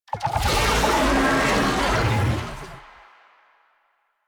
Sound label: Infested Oni Vocal Idle; Warframe